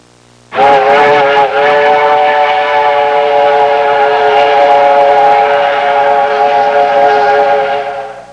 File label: very long train whistle; Transportation